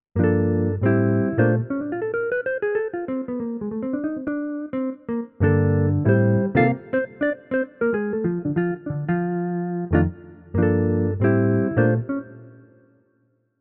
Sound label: Jazz Guitar